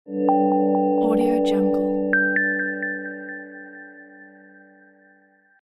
short logo

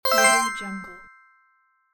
win

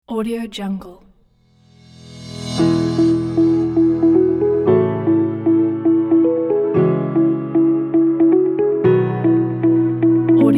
upbeat motivational corporate music pack

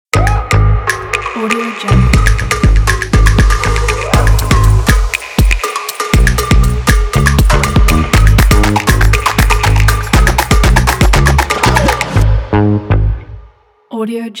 drums stylish logo; onymusic

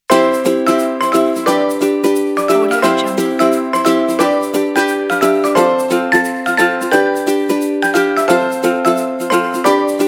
funny happy children ukulele

